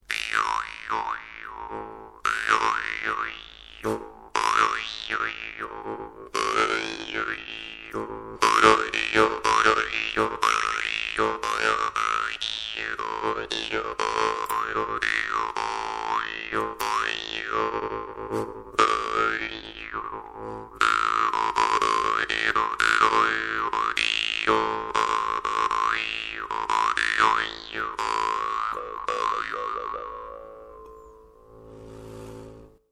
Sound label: parmupilli A, Parmupill bass; madal